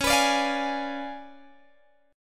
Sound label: C sharp 7th Flat 9th Guitar Open G, chord